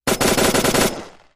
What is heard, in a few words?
M1 Automatic Weapon